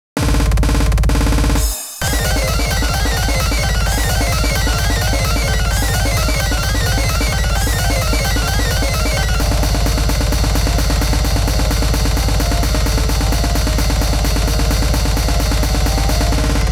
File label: Instrumental